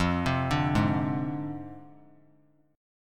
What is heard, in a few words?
F Minor 6th F down; Fm6; arpeggiated; JGuitar